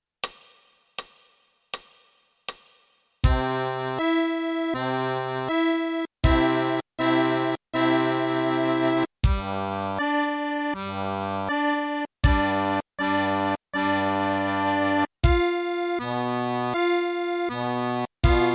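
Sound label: Fisarmonica, Mano Destra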